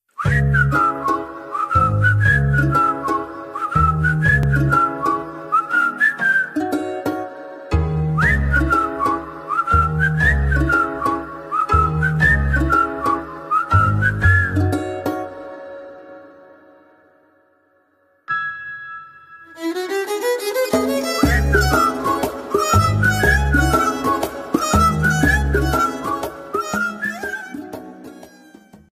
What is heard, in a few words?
bgm visil ringtone; premam